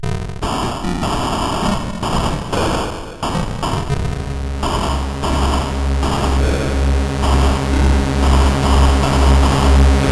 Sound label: Battle Tune sid CSG8580R5